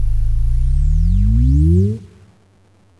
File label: power; SMB3Memes; EoSD Chiptune